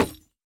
竹木悬挂告示牌破坏声

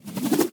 旋风人蓄力声

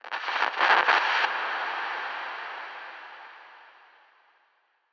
诡异森林点缀声; ambient

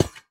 试炼刷怪笼踩踏声

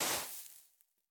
刷子刷沙完成声

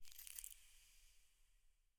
眼眸花闭合声

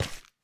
树脂踩踏声

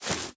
根 踩踏声

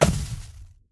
导弹命中声; 潜影贝

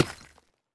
深板岩 破坏声

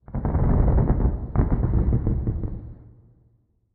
诡异森林嘎吱声; ambient

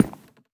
樱花木悬挂告示牌踩踏声

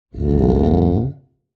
待机声; 嗅探兽

